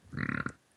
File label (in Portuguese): arroto